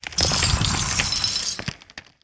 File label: Azerite Medium Underlay Death